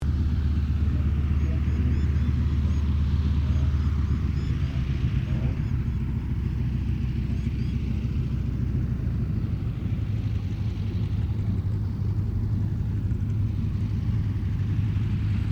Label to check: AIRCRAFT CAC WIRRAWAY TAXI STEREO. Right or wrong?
Right